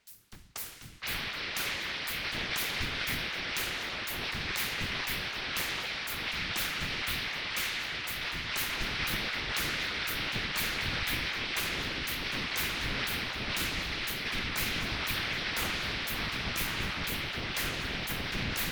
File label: ROCK RIFF Residual Noise